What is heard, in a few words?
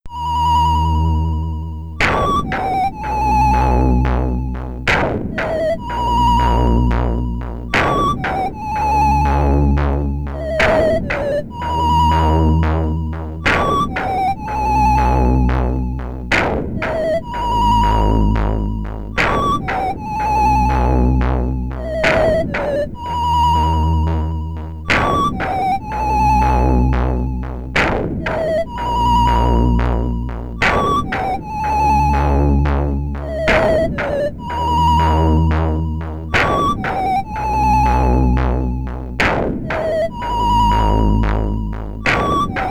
Ending (SID Stereo); Samurai Warrior: The Battles of Usagi Yojimbo; Commodore 64